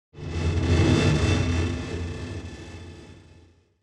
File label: Scratch on a piano string